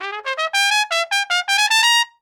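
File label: trumpet lick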